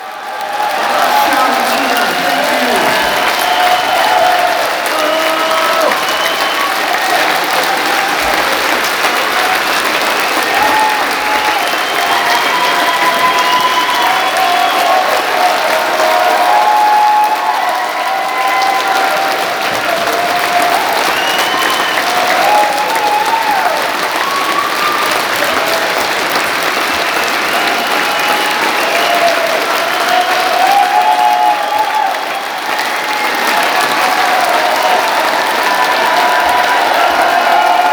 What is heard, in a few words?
Applause, Live; Live 2022-04-29 - Glasgow Royal Concert Hall, Glasgow, UK